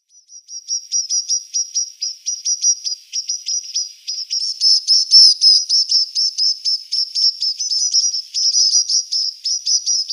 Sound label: Snowfinch nestlings